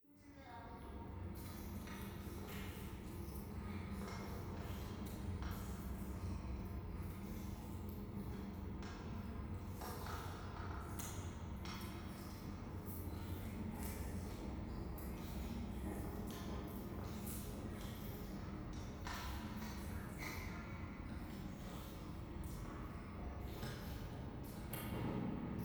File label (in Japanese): 教会 フェラチオ 電車